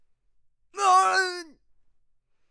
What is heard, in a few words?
male death